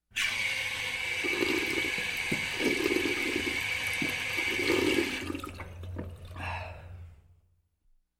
Drinking Fountain Taking A Drink

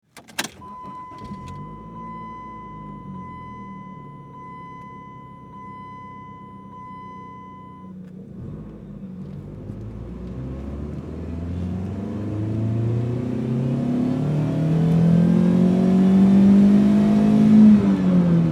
Auto Compact Interior Start-Drive

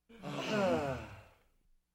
Small Crowd Sighing Relieved

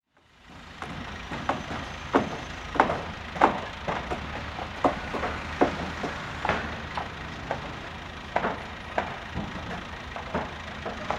Construction

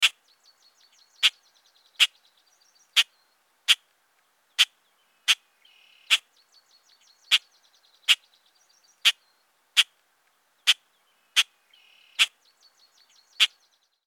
Bird Blackbird

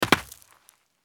Footsteps Sneaker Dirt Jump

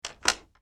Lock

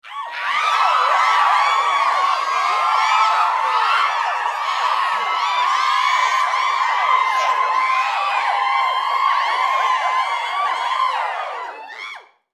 Crowd Cheer

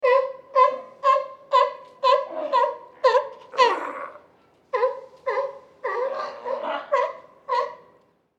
Sea Lion Bark

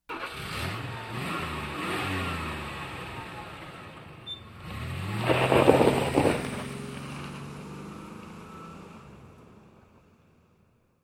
Car Start Rev Engine And Drive Away

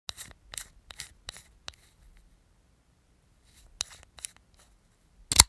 Combination Lock Opening

